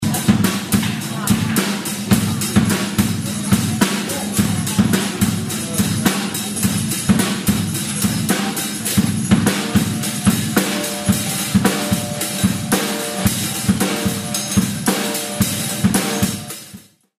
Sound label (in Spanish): percusion